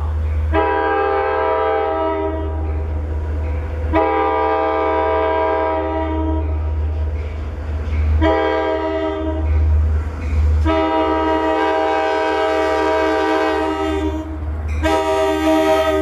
rounds the curve into the Sea Cliff station, K5LA, lirr horns